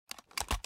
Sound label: uzi draw; Tacz1.1.5+; RSWS 1903